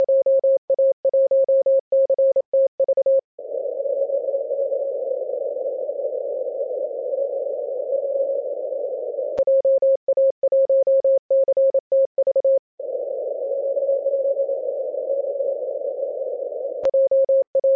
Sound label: 14mhz cw; dxcc